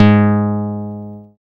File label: BAS MKS-50, Roland